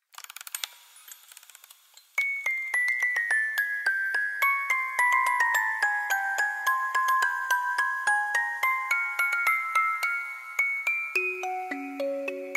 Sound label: music box; Pennywise